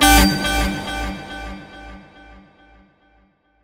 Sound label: Accept, Beeps; SFX